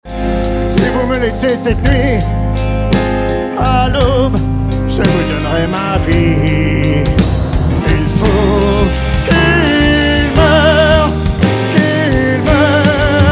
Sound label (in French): Chant Spectacle; DanSing Mix